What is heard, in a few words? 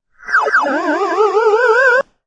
Video Game Sound, Video game sound; Part of Video Games (Sound Effects:Games)